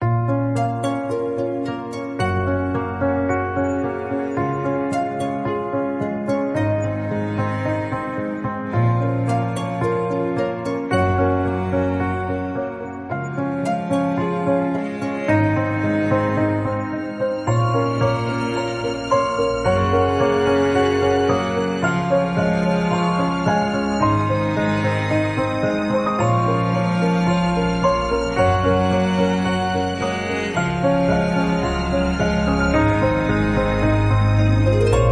Romantic cinematic

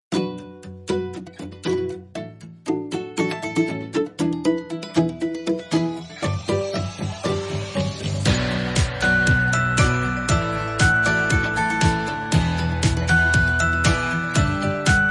Pop motivation

